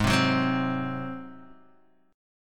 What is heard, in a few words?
G sharp 7th G sharp, Guitar DADGAD